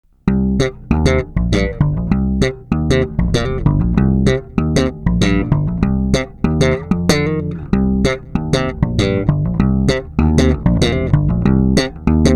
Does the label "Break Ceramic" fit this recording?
No